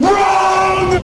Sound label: wrong